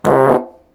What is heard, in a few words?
fart honk